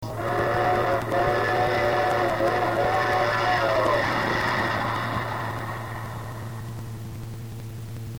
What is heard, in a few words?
choochoo